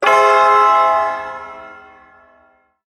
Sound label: Ersatz Shawzin Phrygian Chord I; Warframe; Duviri Ersatz Shawzin; Chords